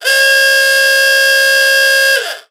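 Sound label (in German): Hupen Klingeltöne Oldtimer; lkw auto; oldtimer waltons autohupe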